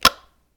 wall switch off

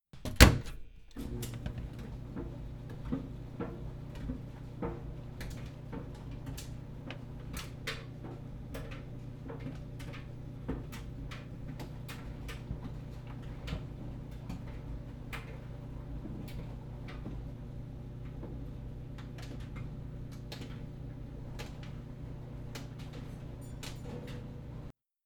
Clothes Dryer

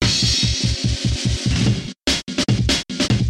Amen Break

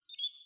Open

